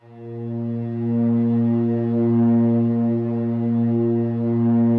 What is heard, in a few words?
slow strings A#1, Yamaha VP-1; Music Store; 90's Sample CDs